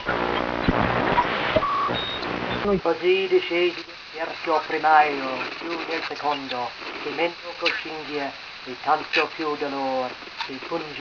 radio; inferno; Counter Strike Model Pack; CS2

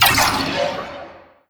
impact, physics, vault

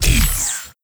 bounce, wheel; combine; Half Life Alyx

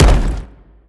bash soft, brickwall, destruction

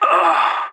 death; Counter Strike Model Pack; CS:GO; leet

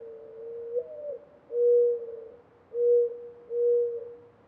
dove call

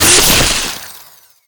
manhack slice flesh; npc; Half Life Alyx

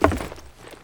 wood panel, footsteps; player

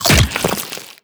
bite pull, barnacle creatures; Half Life Alyx

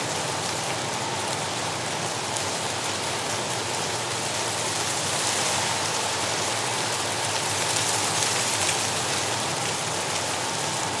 amb tree rustle lp

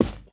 carpet, footsteps

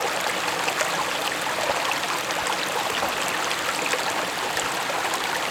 swamp waterfall; aztec2

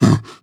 breath short, zombie

